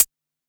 Quasimidi 309, Drums

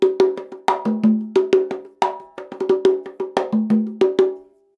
CONGA, Percussion Loops; USB Soundscan vol.36; AKAI; 90CONGAS

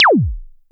korg trident

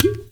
UDU; Spectrasonics; Heart of Africa 1; NIGER.UDU

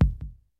KICK SNARE; Kid Nepro; Elite; KICKS SNARES

